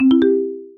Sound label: agent connect